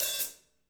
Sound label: snap; ID KITS; Sonic Reality; Interactive Drum Kits; 90s sample cds